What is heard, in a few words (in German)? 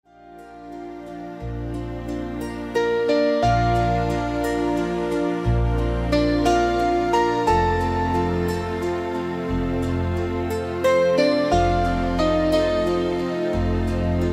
Stimmungsvolle Advents- Weihnachts-Wintermusik; Melodie erinnert etwas an ein bekanntes Weihnachtslied; Adventtime